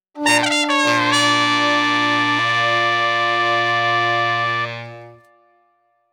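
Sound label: Street Brass New Orleans Transitions, 95 bpm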